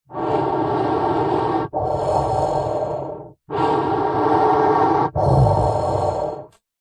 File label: human long breaths through long hollow card tube; zapsplat